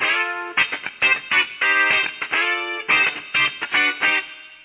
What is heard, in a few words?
funk bit